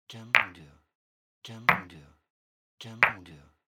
Brick

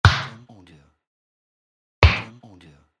Impact Hit

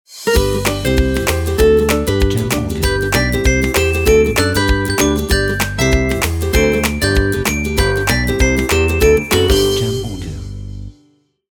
Happy Logo Kids

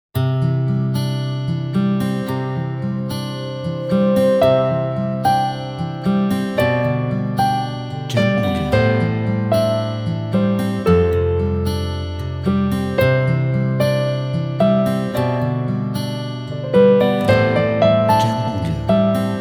Summer Inspiring Guitar Piano

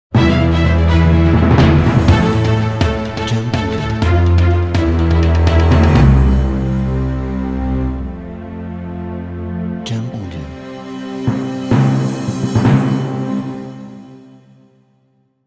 Cinematic Trailer Ending Outro

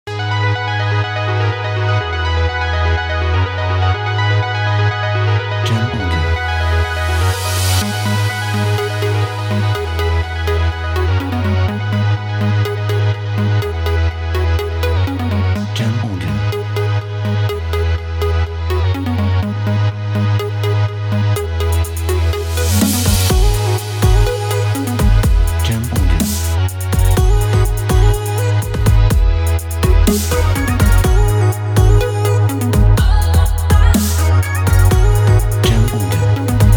Technology EDM Embient

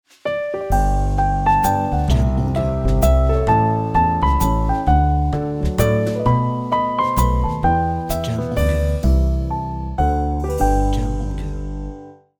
Christmas Logo Jazzy

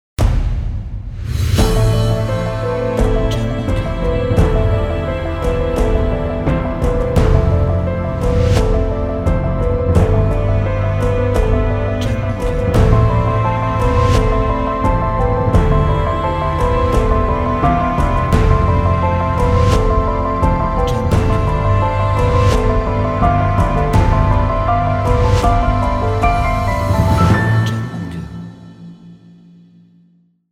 Cinematic Trailer

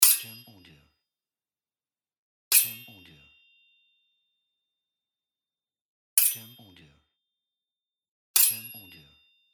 Sword Hit Fight

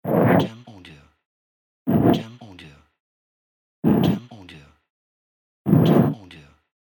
Stone

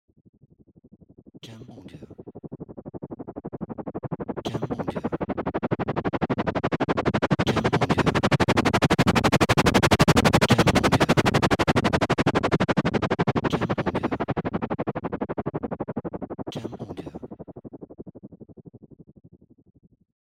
Helicopter

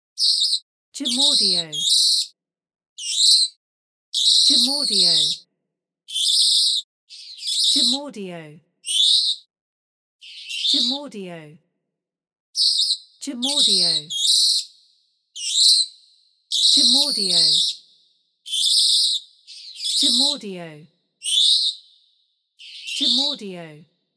Birds Parrot

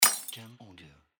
Breaking Glass

